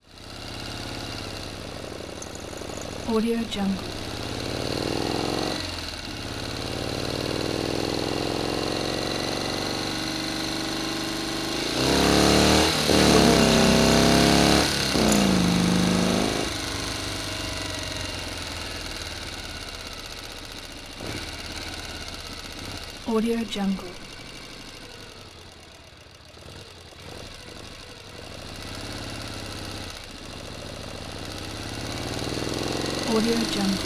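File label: effects exterior pan by; collanderson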